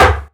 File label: annoyance, Snares Knocks